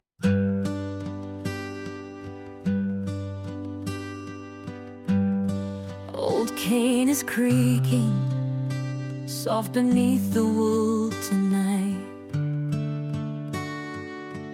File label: lullaby, name songs; caroline